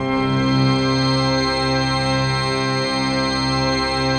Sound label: PAD, ATMO PADS; Best Service; XX Large